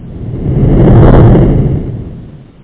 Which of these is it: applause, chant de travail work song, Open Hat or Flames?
Flames